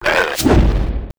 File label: attack; SNATCHERREX; Mob